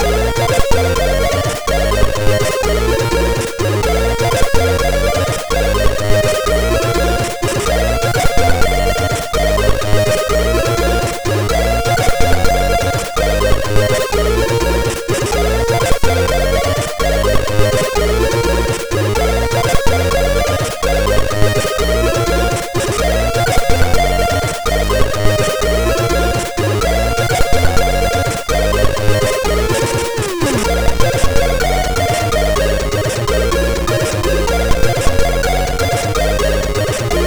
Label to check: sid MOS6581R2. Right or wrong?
Right